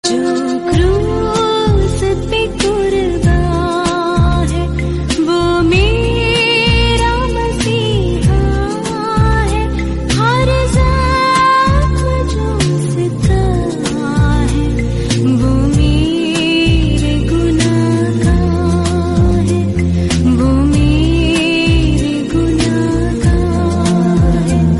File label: hindi song